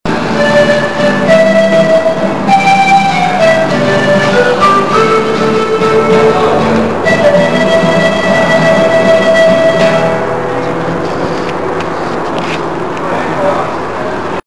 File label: pike place music